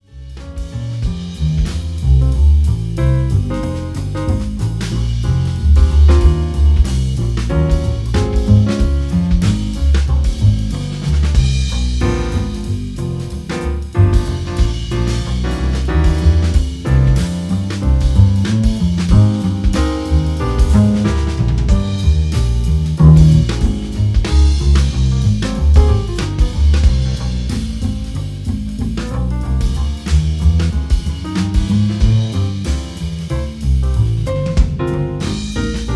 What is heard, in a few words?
jazz trio